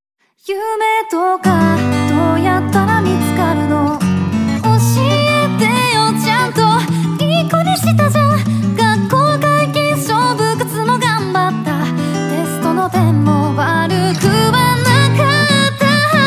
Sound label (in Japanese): (Guitar)